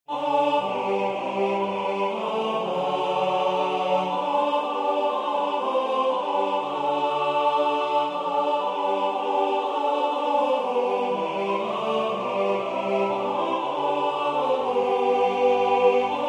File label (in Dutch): Hymne Tenor